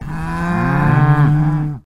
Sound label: Cow